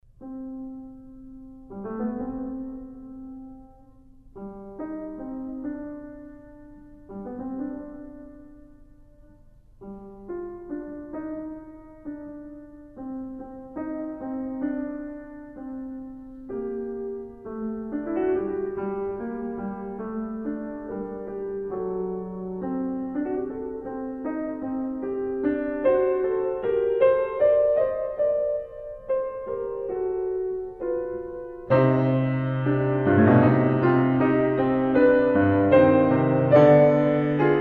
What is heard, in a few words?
Fugue in C minor; Geniušas; Ciurlionis